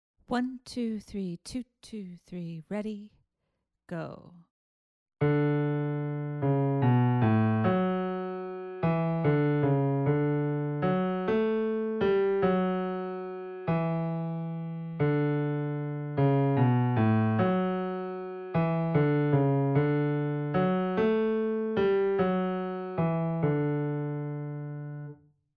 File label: melodic dictation